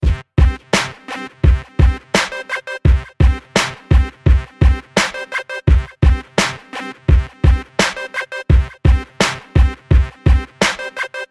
Hip Hop King Beat

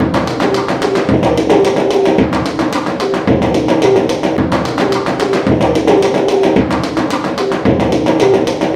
Japanese Drums

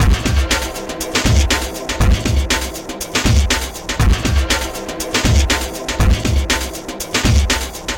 Hard Atmospheric

